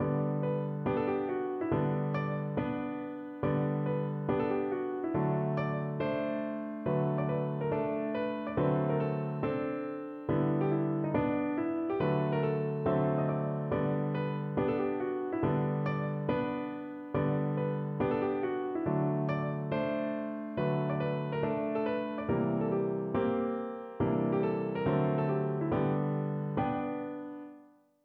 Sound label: piano; Petra Skoumala; Pat a Mat (A je to!); Lubomíra Beneša a Vladimíra Jiránka